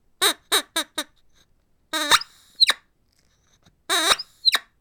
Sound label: Rubber Chicken